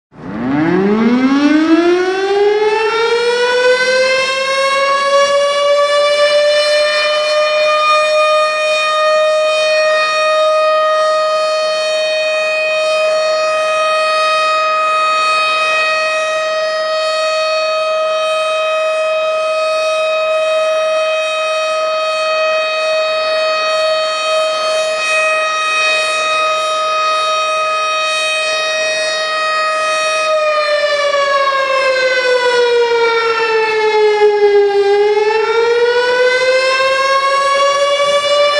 tornado siren sound; tornado siren sound effect

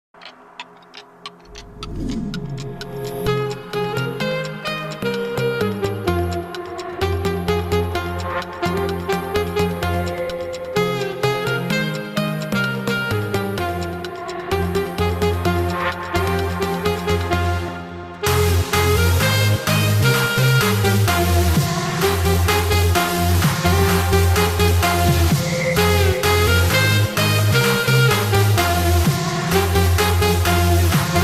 saxophone cover

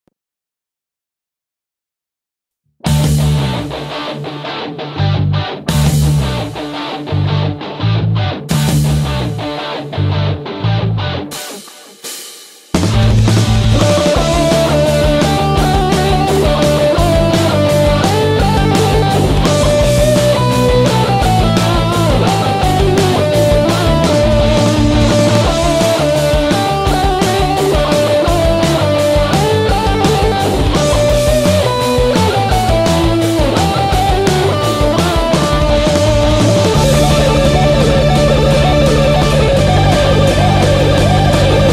Heavy Metal Happy Birthday

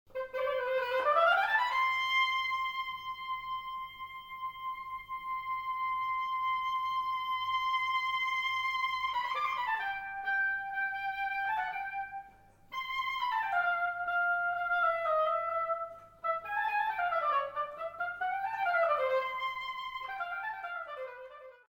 Oboe

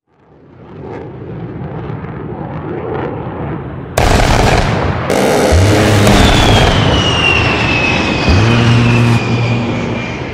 A10 sound effect

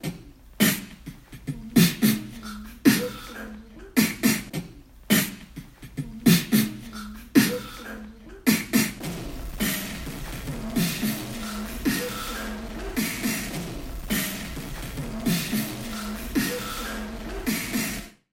beatbox

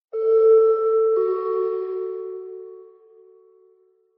schedule reminder

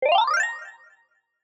cute level up

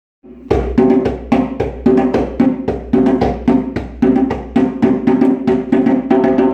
Djembé

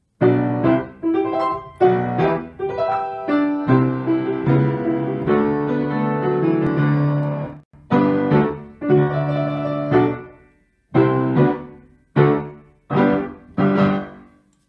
Intro Music Piano; Disney; Toy Story

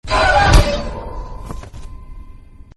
Baseball Bat Jumpscare Sound Effect; Granny

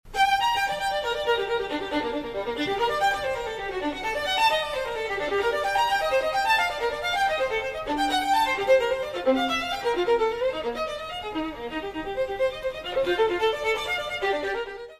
violin

